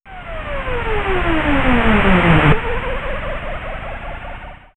SciFi Landing; synthfx; X-Static Goldmine

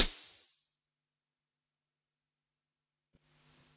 Quasimidi 309, Drums

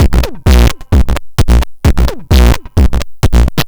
Crushed Beat 130BPM; musicradar

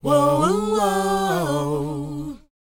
WHOA D, Vocal, R&B & Dance; ILIO; Vocal Planet

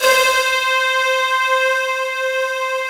BREA PAD C5, BREATH SYN; Synth

